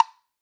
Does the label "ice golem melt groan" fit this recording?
No